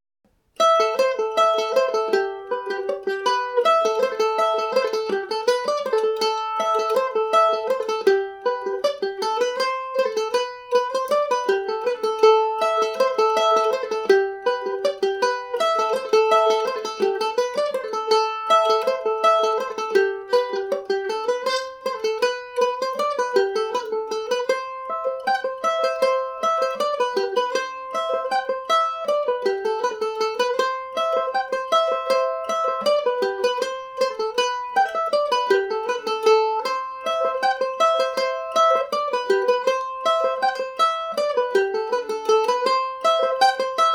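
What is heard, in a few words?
A dorian, irish mandolin; curragh races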